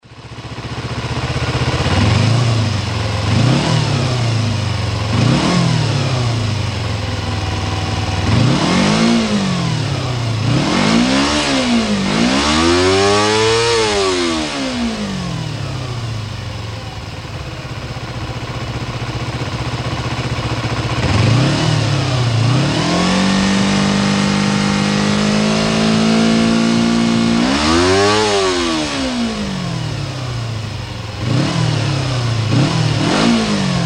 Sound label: Kawasaki Ninja 250